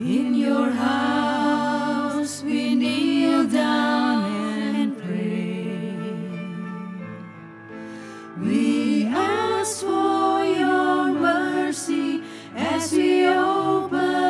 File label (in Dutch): Indonesisch Koor Lord we gather today